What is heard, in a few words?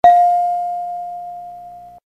ding; wheel of fortune